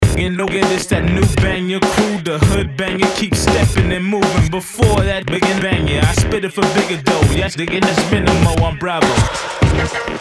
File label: Funk Machine